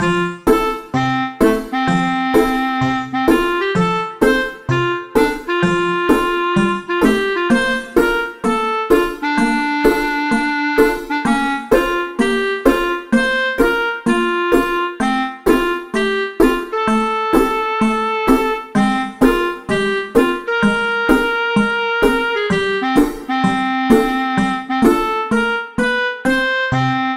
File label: menu rehearsal general